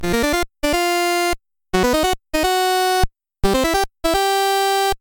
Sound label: Alert, ringtones; 4th & Inches